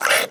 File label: breath in, headcrab